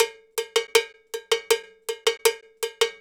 Cow Bell, Latin Percussion

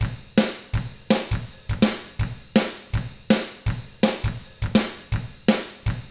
ROCK, Double Platinum Drums

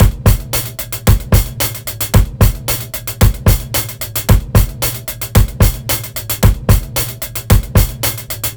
beat, Roots Funk; USB Soundscan vol.41; AKAI

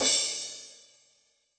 Crash, Cymbal, Yamaha DTXpress-II

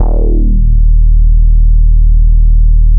FUZZ D#1; Strictly Synthe1; E-MU Producer Series Vol. 6 – Analogia Project

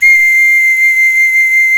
pan H 6, panflute; SoundBytes - Early Patches

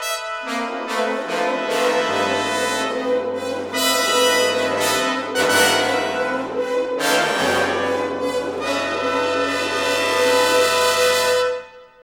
FANFA; Roland - LA Composer vol. 2; FloodSet

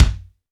KICK, AMBIENCE KICK

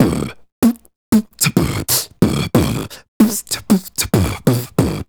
Beat Box, Hip-Hop and RnB Vocals

